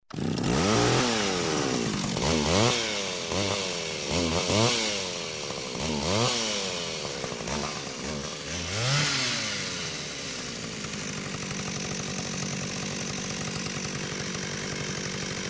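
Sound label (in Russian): benzopila, chainsaw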